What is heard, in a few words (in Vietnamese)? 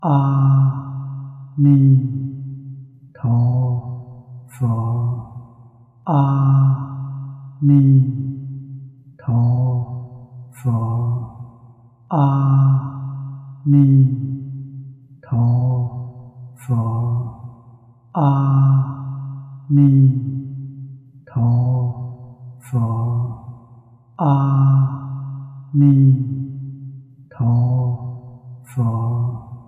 Niem Phat Cham; Hoa Thuong Tinh Khong